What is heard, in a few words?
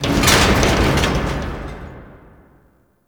Metal Glass Rattle; Ambience; Props; Warframe; Entrati